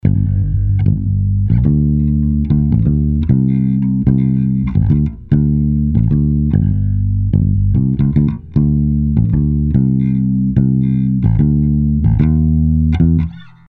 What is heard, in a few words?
bass with a; aaaudio